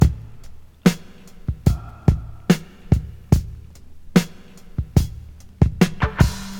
73 bpm drum beat g key, Free drum beat - kick tuned to the G note. Loudest frequency: 640Hz; drum loop